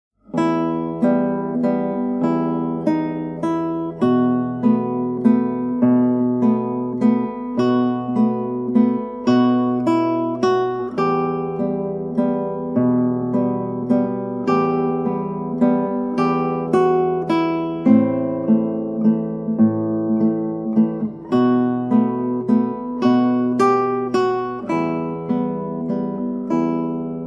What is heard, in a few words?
Vals; والس